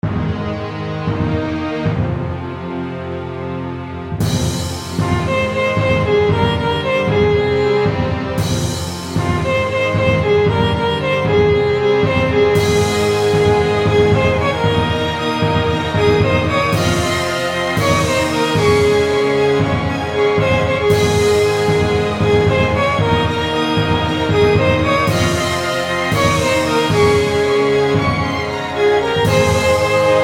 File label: theme Orchestral; AVGN